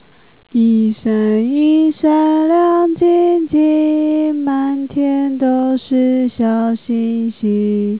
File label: twinkle twinkle little star